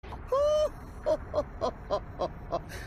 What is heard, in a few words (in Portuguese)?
risada; freeza